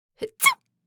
Cute woman sneeze

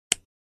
Metal flashlight button press to switch on or off

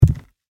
Footsteps on wooden floor barefoot single step

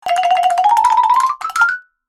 Cartoon running movement funny

